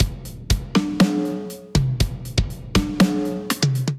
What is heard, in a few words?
Kit, Drums Acoustic; Timbuktu Kit